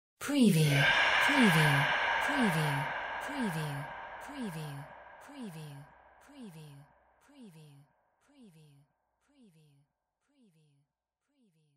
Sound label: SCIFI WHISPERS SPOOKY OOWA; windy beach audio